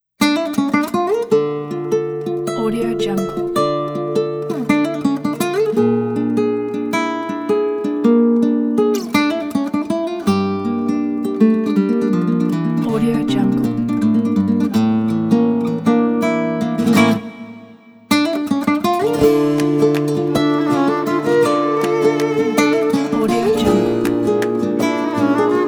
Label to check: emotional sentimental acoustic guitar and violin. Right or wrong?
Right